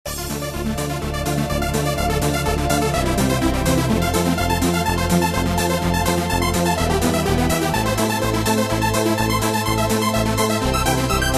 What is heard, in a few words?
ringtone